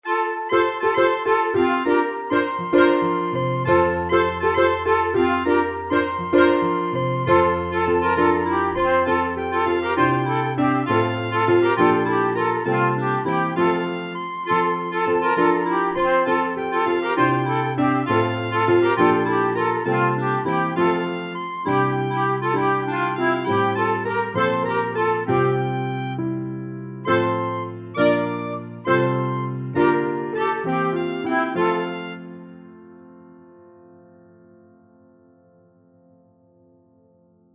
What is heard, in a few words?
on christmas night woodwind